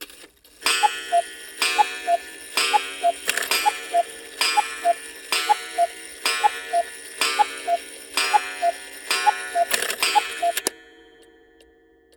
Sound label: cuckoo clock